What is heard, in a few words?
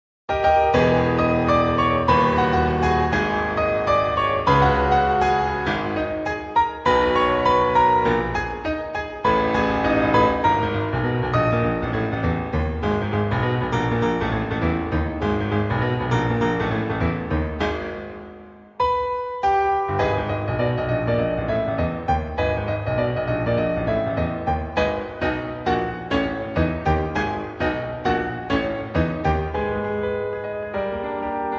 music; rimworld add my music mod; Gameplay